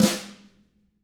TAMA, Drums

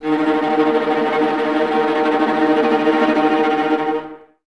Violas Tremolo D#2, String Ensembles; Miroslav Vitous

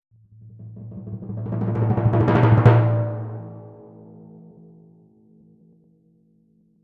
Crescendo-hit A, Timpani; PowerFX; Downtown Orchestra

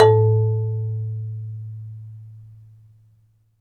MARIMBA; ILIO

